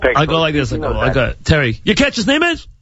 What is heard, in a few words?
Scream; WFAN